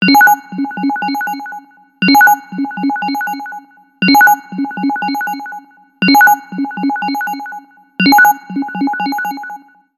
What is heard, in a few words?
Orange, ringtones, HTC One M7